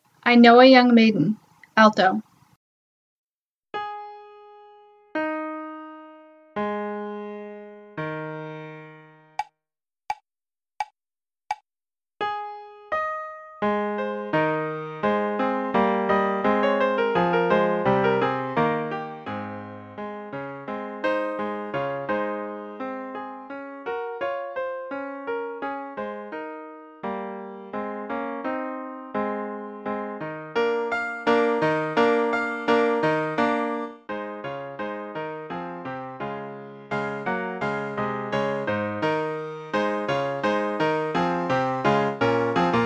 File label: full alto young maiden